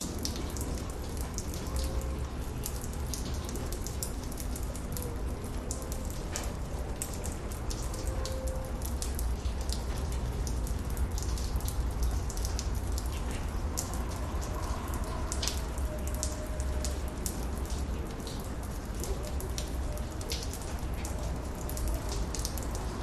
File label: raindrops splashing on a pebble street train passing by in the distance; city; Sound Ex Machina